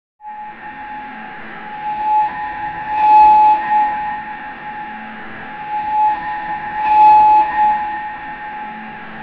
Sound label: sound effect of, space suit